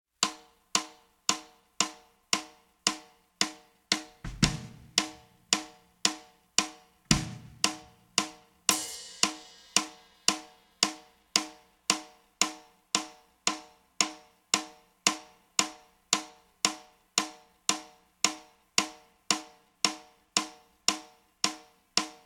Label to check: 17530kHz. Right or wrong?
Wrong